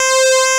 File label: string C4; CMP - Analogon; FLORGAN